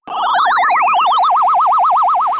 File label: scanner scan